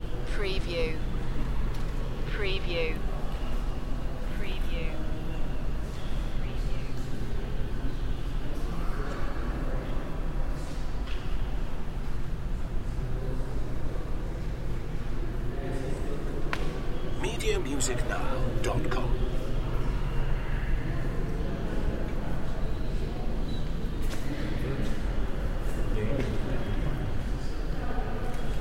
Light Crowd In Large Hall, National History Museum